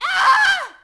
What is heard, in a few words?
girly die; female characters; AoM-ARTofMyth